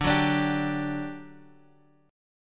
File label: D-Major Add 11th D; strummed; JGuitar